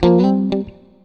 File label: STRAT, GUITARS; Synclavier Sampler Library; KEYS AND GUITARS